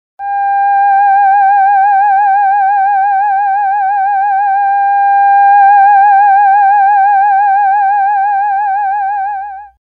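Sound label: theremin note G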